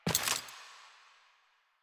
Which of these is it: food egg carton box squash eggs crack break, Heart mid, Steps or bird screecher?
Steps